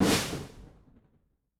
Ambush; Yamaha DTXpress-II

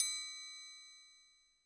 percussion, Roland TD-20

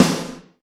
Alesis DM5 Snare

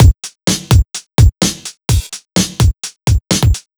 Drums, 127bpm, Nu Dance Electro; Zero-G; Soundclash; Acidized Wavs; Combi Loops; Southbeach Infusion

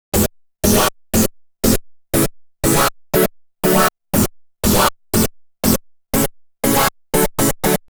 Synth Chords; Eclectic Electronica

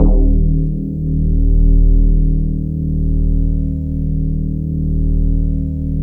E-HARP, DX HARP; Wizoo - Powered DX

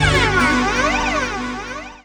Chords Tones; Equipped Music; Loungin House

